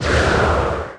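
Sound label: Hit long range wind shell; The Denpa Men; Android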